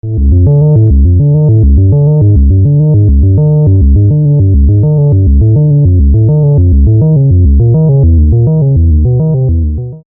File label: Modmatrix